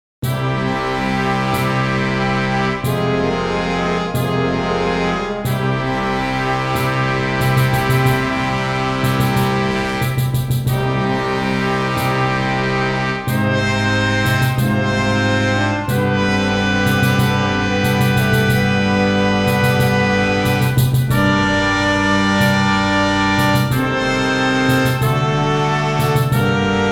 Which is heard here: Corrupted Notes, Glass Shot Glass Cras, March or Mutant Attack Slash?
March